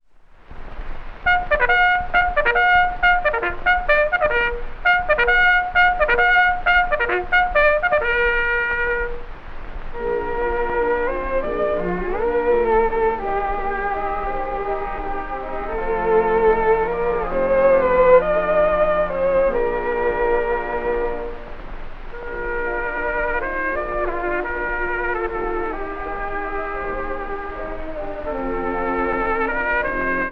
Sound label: trumpet solo with orchestra, saxophone